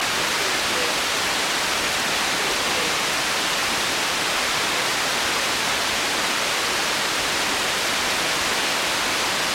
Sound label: Weather Rain On Lake Loop